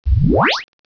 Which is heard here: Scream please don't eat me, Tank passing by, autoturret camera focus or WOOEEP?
WOOEEP